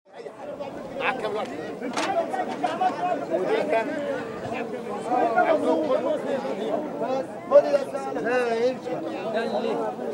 shum tolpy na rynke

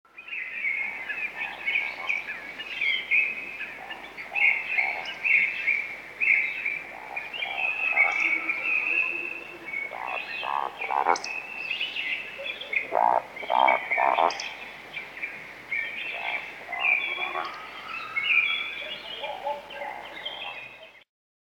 golosa valdshnepov i zvuki prirody

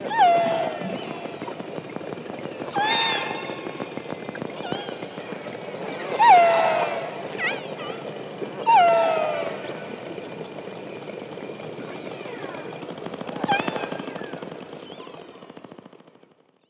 zvuk kasatki

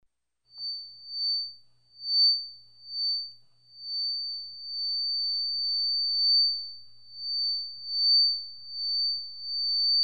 mikrofon fonit

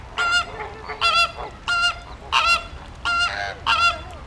zvuk vzroslogo flamingo

